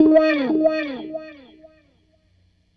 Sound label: Strat Guitar Loop; Sonic Foundry (Sony Creative Software) - Six-String Orchestra